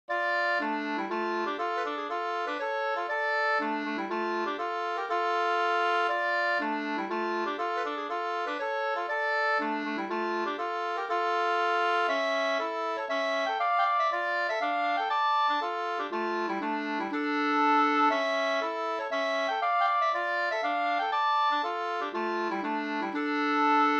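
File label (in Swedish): polska, tvåstämmig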